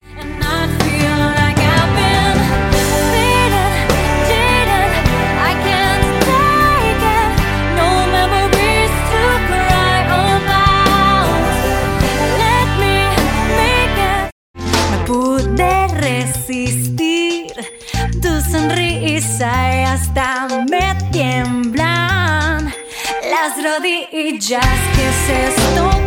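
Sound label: Latin American Spanish, Singing; GoLocalise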